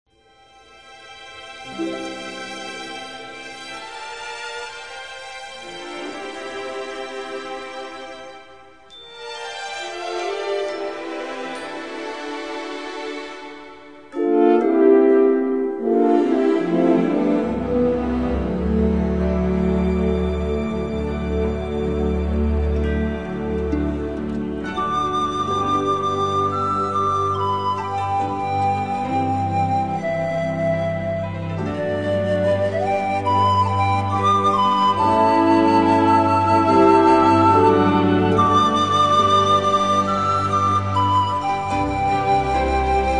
Orchestra Panpipes, What A Friend We Have In Jesus (Medley)